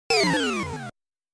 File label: Space Bee, Motos Fall Sound